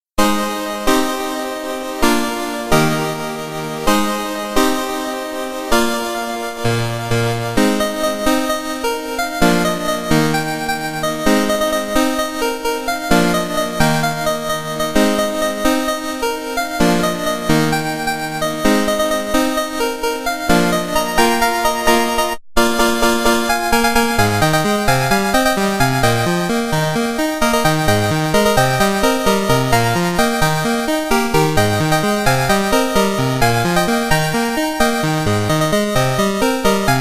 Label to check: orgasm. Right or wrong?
Wrong